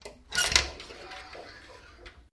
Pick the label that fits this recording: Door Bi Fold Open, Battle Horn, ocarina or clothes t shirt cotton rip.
Door Bi Fold Open